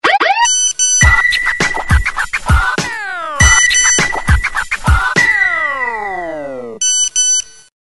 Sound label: Scratch Mash